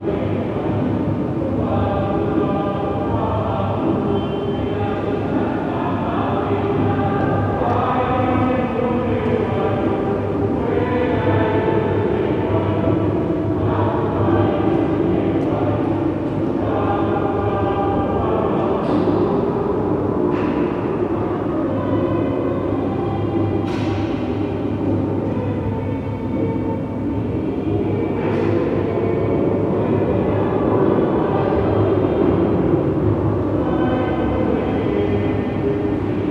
public places church ambience gospel choir rehearsal in background; felix blume